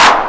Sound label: KLAP, Big Beat; Big Beat Samples & More; AKAI; CLAP KIT